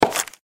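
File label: block destruction; jewelpetsmatch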